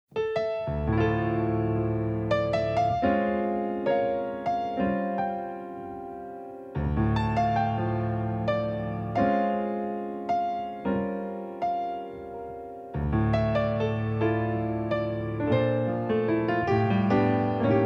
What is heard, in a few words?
Latino Jazz